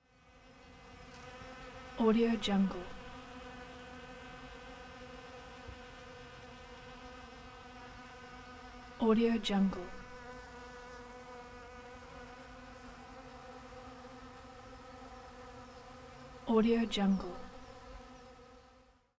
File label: bees